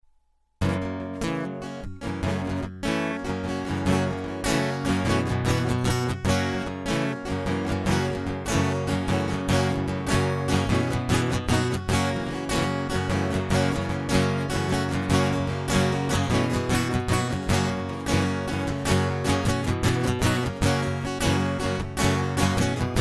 N Lightening Guitar Loop; hyper terrestrial

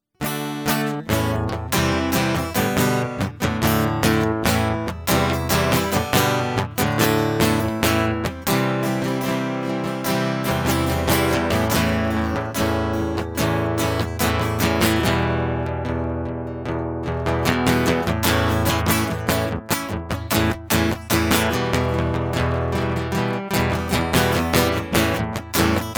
Comeback Instrumental; hyper terrestrial

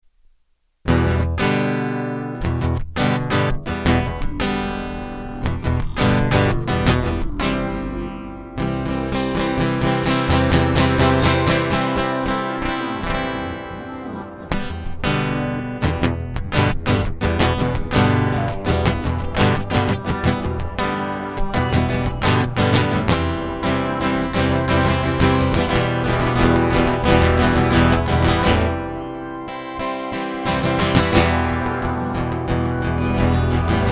Instrumental

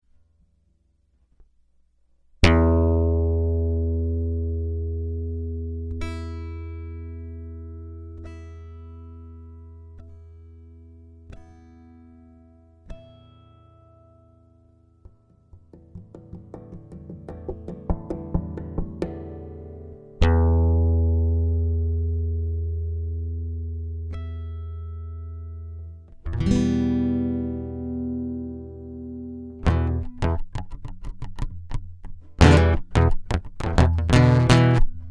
Guitar Loop

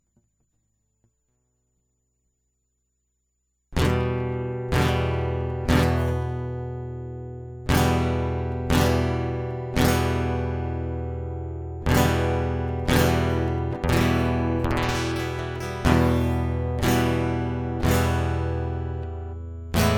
Fit Of Love Guitar Loop; hyper terrestrial

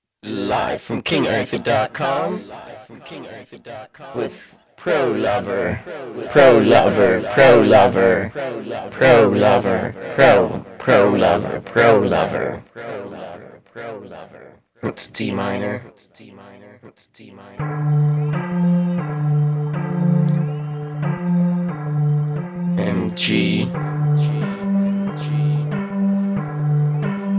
Soundcheck

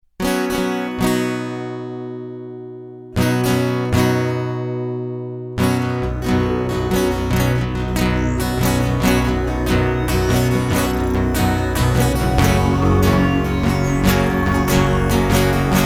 Instrumental

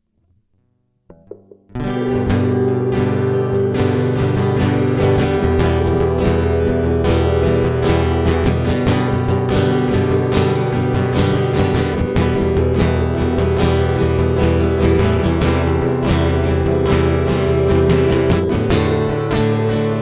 Instrumental

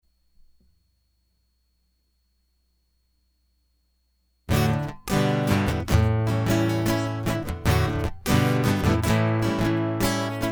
Guitar Loop

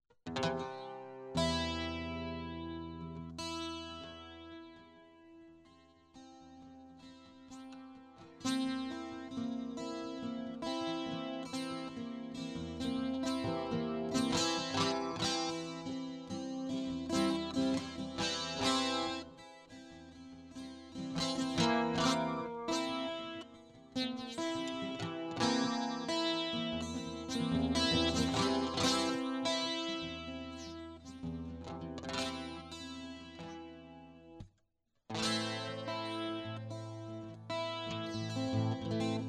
Guitar Loop

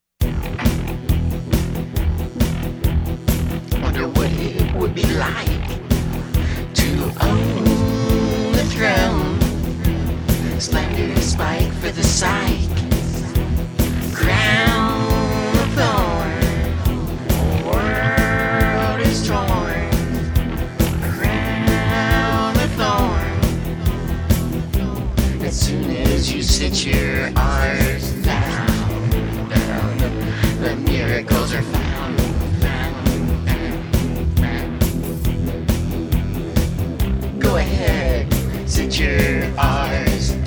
Alternative Rock

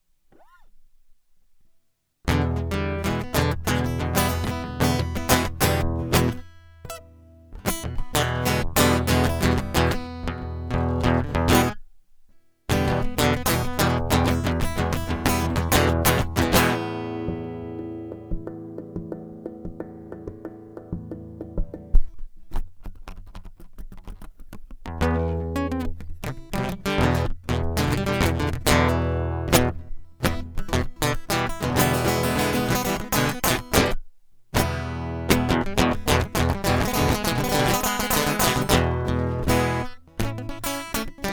Guitar Loop; hyper terrestrial

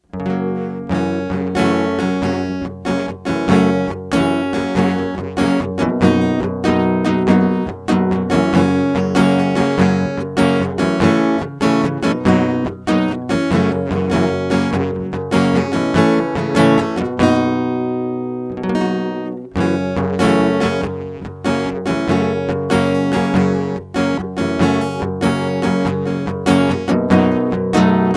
Guitar

